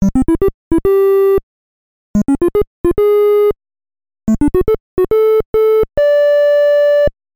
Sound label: Charge